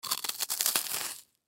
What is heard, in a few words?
Human Bite Food; Bite Food Eat Cracker Single Crunch Bite Food; Eating